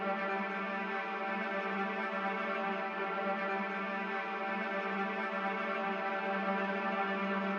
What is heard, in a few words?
Viola Sul Ponticello mf bg loop; gamerip; the detail